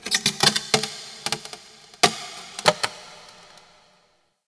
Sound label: Terrarium Glass Stress; Warframe; Ambience; Entrati